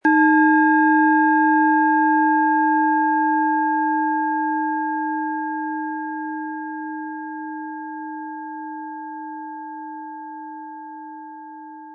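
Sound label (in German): Planetenschale Eros inkl. Klöppel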